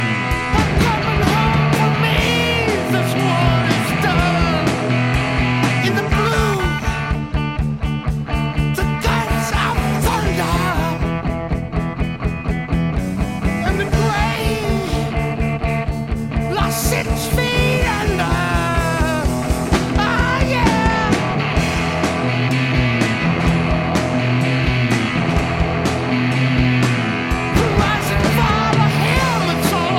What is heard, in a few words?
mixed